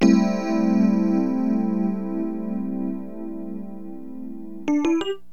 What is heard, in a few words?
Rhodes, HipHop Keyboards; LiveAct Pool 1